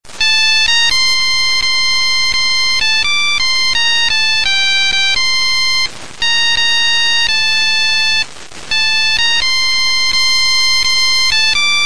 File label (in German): polnische hymne